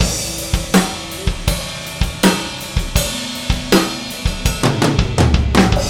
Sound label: loop